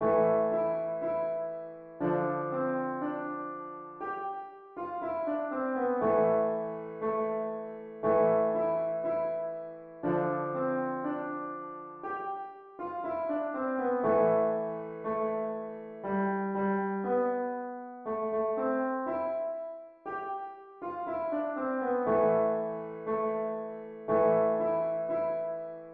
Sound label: piano